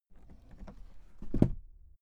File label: suitcase close case soft